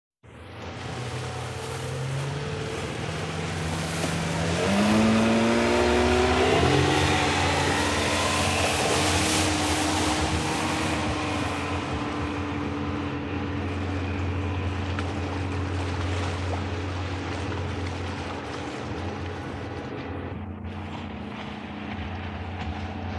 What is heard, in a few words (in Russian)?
Vodnye lyzhi